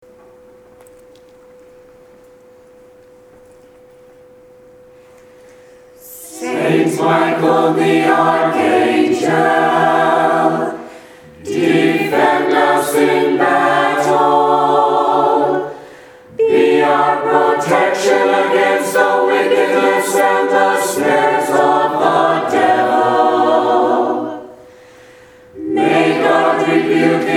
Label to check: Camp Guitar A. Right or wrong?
Wrong